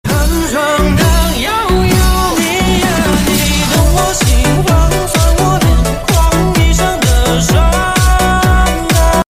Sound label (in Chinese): （DJ版）